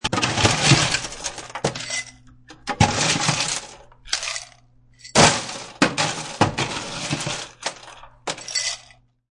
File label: Ice Dig In Glass Multiple, Digging in an ice machine then dumping ice in glass.; Ice Machine Dig Into Glass Multiple